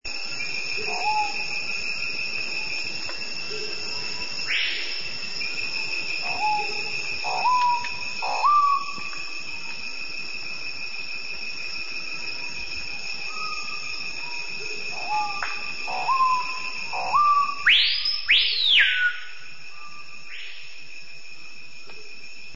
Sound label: screaming piha; Peru